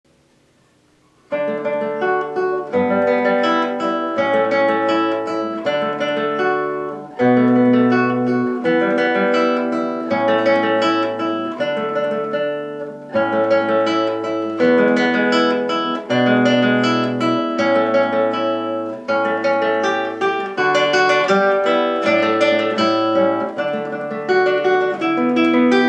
Etude, guitar

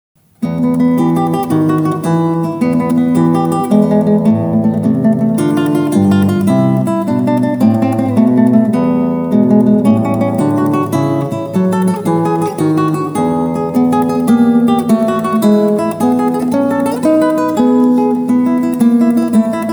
TARANTELLA, guitar

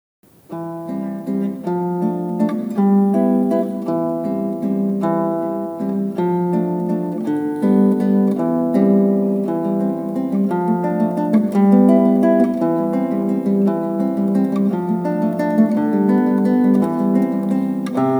WALTZ, guitar

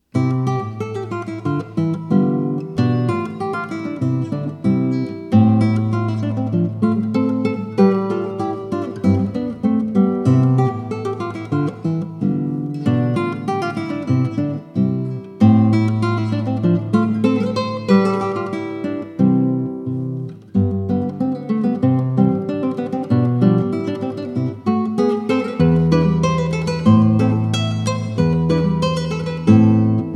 Polka C-dur, guitar

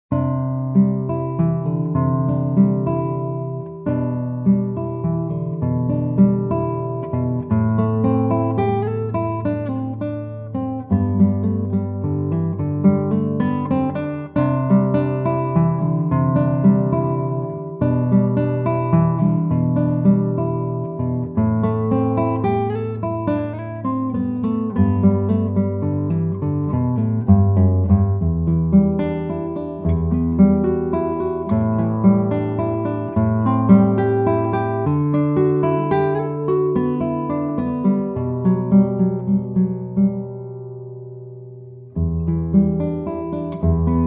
Lullaby, guitar

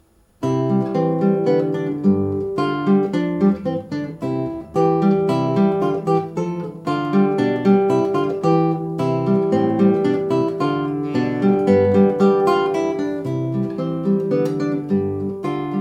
Interval, guitar

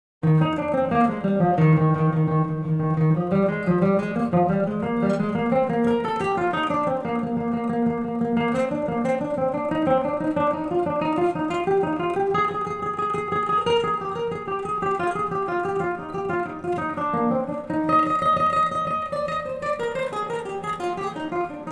Fast fingers. Etude, guitar; No.15

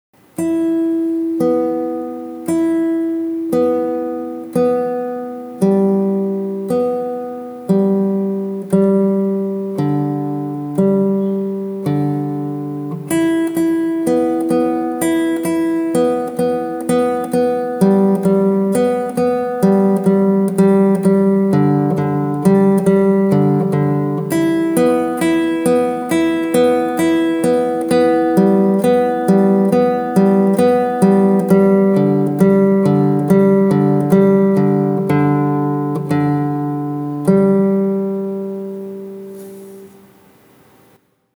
CLOCK WITH A BEAT

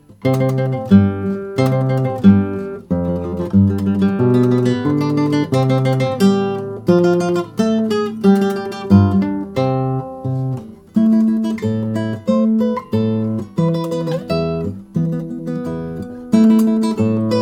Woodpecker, guitar

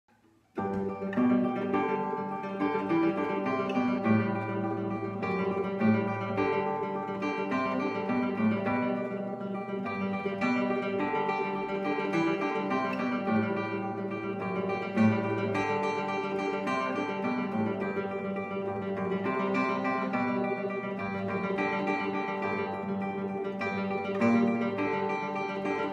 Etude, guitar

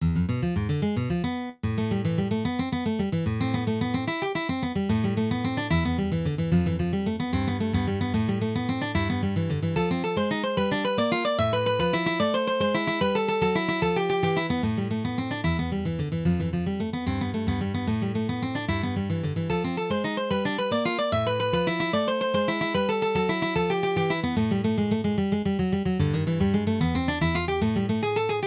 scandinavian dance etude

